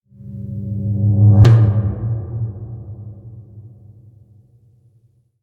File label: Cinematic Drum Hit